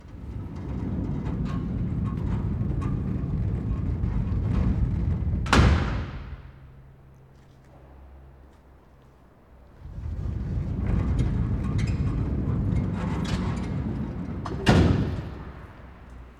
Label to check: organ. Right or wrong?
Wrong